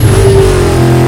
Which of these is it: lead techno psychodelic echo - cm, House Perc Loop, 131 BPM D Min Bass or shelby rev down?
shelby rev down